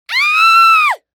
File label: Horror Scary Human Female Scream Short